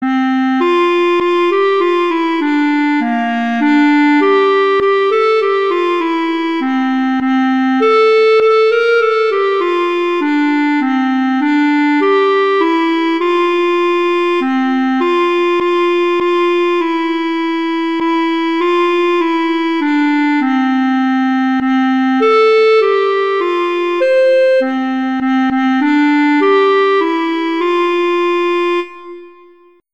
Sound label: We Wish You a Merry Christmas; Christmas Beginners